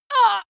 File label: female combat hit soft